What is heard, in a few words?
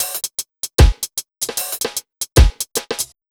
BT, Ragga & Dub Reggae